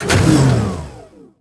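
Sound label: door training large; Star Wars Obi-Wan; XBOX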